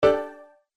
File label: cancel